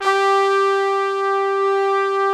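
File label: BRASS G3 right, ORCH BRASS; Greytsounds VOL-1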